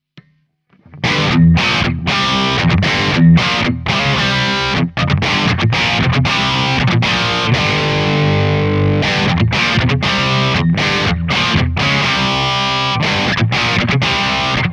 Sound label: backing